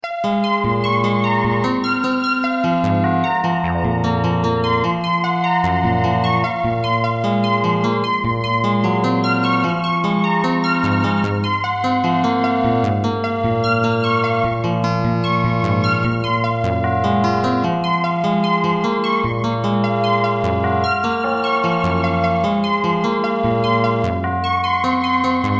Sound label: Rain Machine